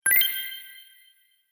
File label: Magic Idea; Magic Sound Effects